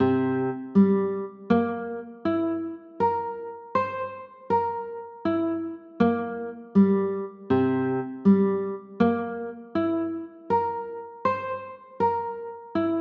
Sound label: c 6, chords